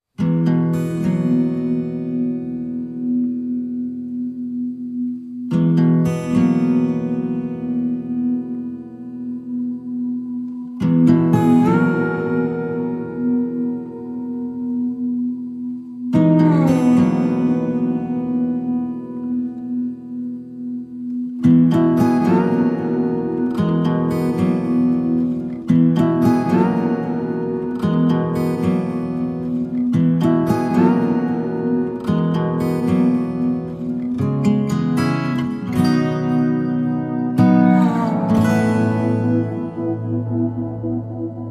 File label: No Piano